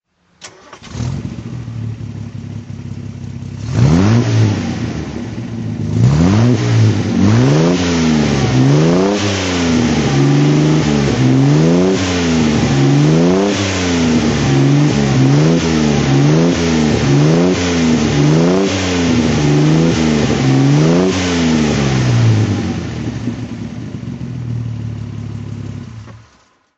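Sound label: healey 3000 mkII 3carb throttle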